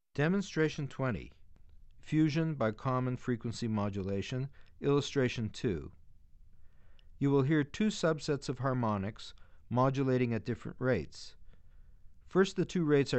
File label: Fusion By Common Frequency Change; Illustration 2; Bregman ASA; auditory demonstrations